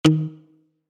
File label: error